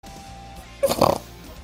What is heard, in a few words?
snort